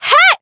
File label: Voice attack